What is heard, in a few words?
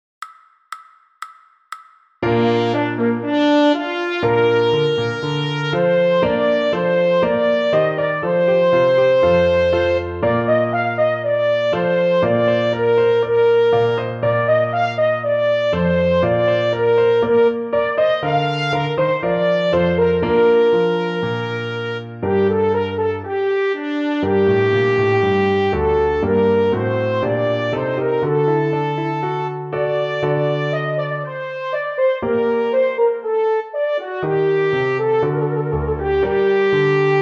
Trumpet March trumpet fast